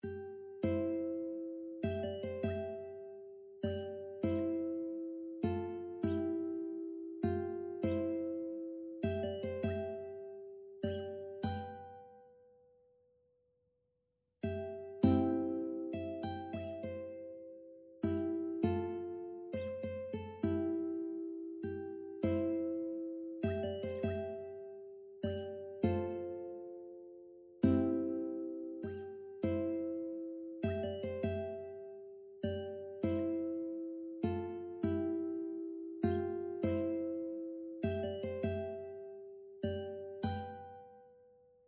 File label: Amazing Grace ukulele